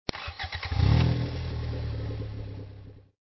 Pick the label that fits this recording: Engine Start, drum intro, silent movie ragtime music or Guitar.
Engine Start